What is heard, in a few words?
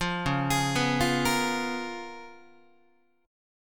Db 13th Guitar, Db13 chord; Db13 arpeggiated; JGuitar